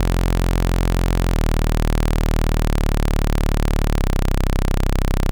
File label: Saw bass before; Valvesque